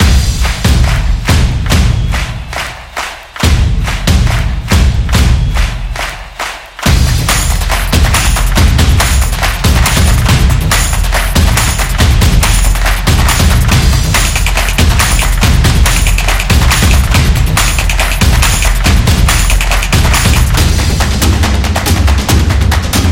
A Football Beat, sport drums

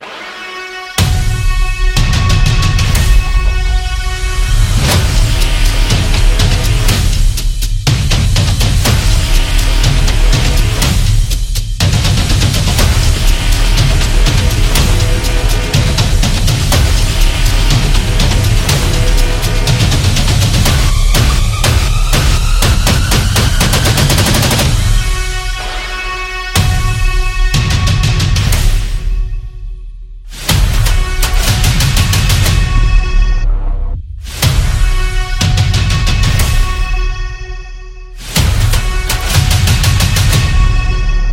Epic Trailer Build Up; epic drum

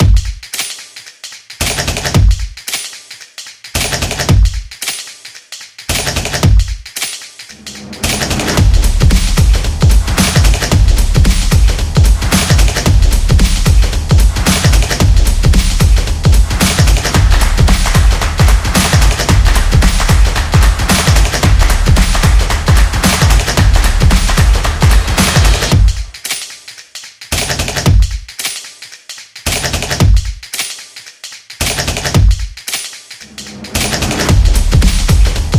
Modern Beat; upbeat drum

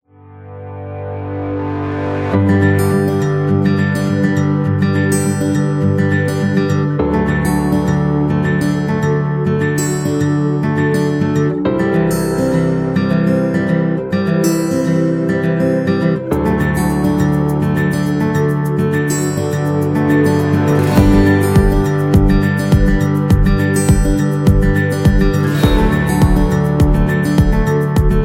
Corporate Folk, corporate music; corporate music vol 10

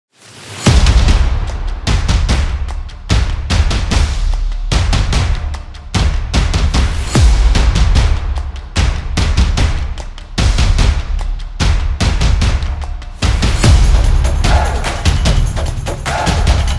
Drumming Teaser, energetic drumming

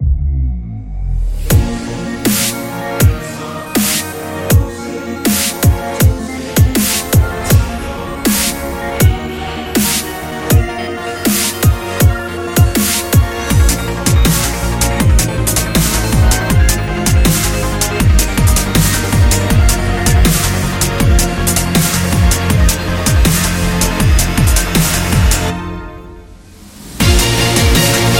Dubstep Epic; planetarium

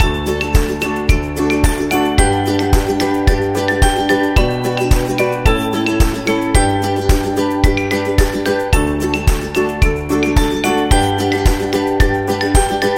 Kid Commercial; cooking show

